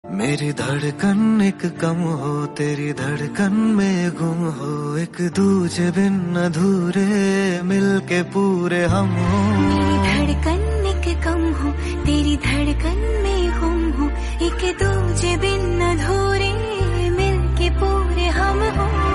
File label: bgm; Shubharambh